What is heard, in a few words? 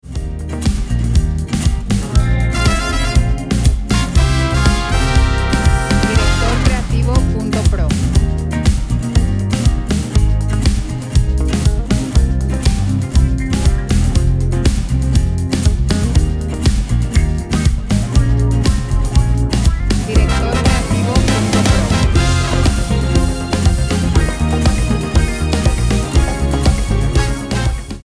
113bpm 4/4 Fm